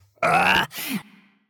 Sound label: Punch Right Speed Up